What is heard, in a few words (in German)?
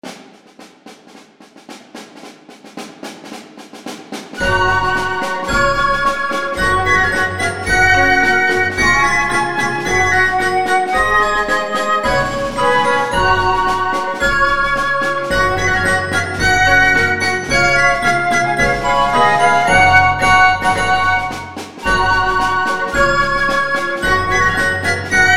Schwedischer Kriegsmarsch